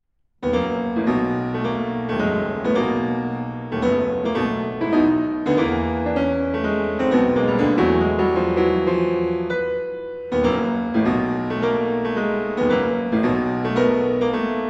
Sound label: Sonata Allegro energico; Barber